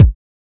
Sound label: short reverb kick drum A key